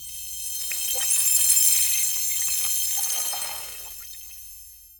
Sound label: soft shimmering waves; aquatic